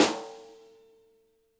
snare rim; Noises; Attacks Misc

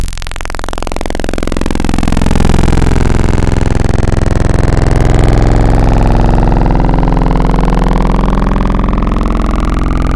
Torn Mod, Serum Wavetables; Synthetic's Serum Collection; Cymatics - Nuclear for Xfer Serum Wavetables

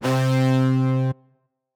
SYN Digital Trumpets; Synthetic's Serum Collection

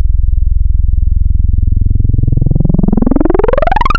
303 Acid

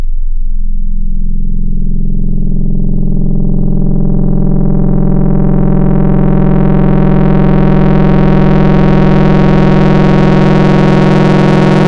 FM - Harmonics; Wavetables; Synthetic's Serum Collection; ESW Core Wavetables; Echo Sound Works Core Tables

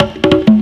loop; Happy Tekno Track Contest SamplePack

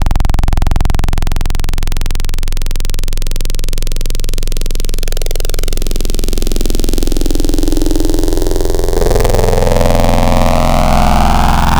Fm Squawk; Synthetic's Serum Collection; Cymatics - Nuclear for Xfer Serum Wavetables

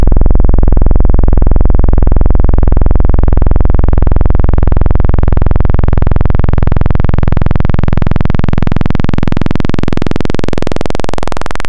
Analog Moog Saw; Synthetic's Serum Collection; ESW Core Wavetables; Echo Sound Works Core Tables

